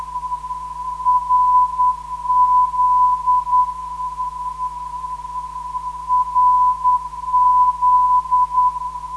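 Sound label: 474kHz, non-directional beacon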